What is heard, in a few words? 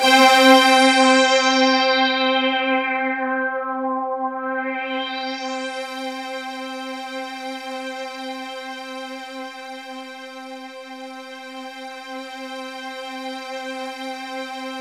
7TH-WSTRG, KURZWEIL K2000